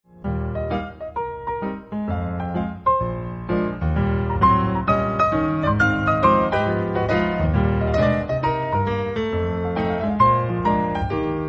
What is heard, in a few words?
solo